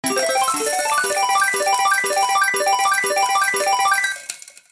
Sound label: win superrare legendary; slots; gangsterallstar